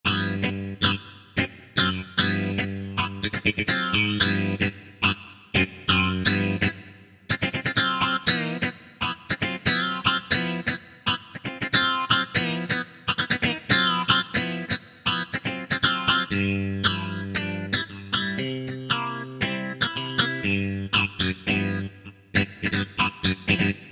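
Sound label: funk phase, guitar; Factory Patches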